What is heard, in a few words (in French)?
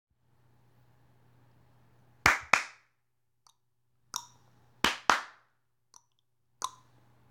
rythme langue mains